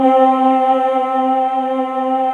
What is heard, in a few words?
VOX SETS C4, Choir; USB Soundscan vol.28 - Choir Acoustic & Synth; AKAI; VOIX SETS